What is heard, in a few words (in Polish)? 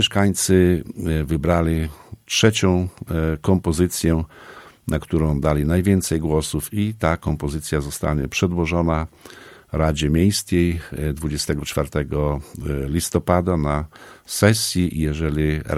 hejnał